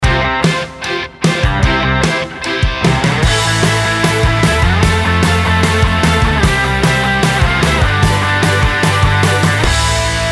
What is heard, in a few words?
Bed Rock